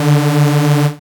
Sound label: BLAST D2; dubstep; Jack Sparrow; Deep Dubstep Progressions